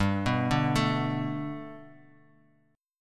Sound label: G Major Add 9th chord; Gadd9 arpeggiated; JGuitar